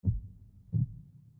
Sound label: heartbeat